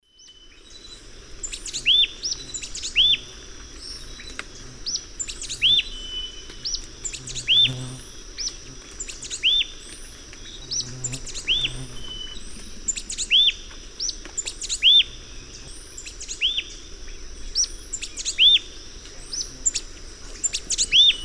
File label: Euphonia laniirostris, song; S. America; Eastern Lowlands